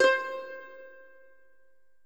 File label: mandolin